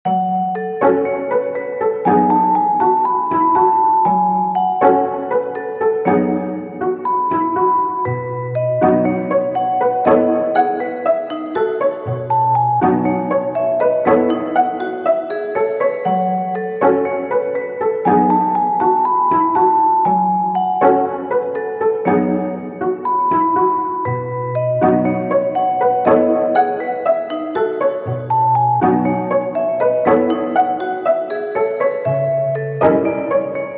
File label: Classical Pop (Instrumental)